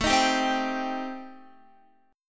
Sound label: A Minor 7th Flat 5th A Guitar, chord; Am7b5; strummed; chordshape